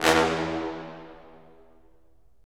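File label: brass cimb, Roland; Roland LA Composer vol. 4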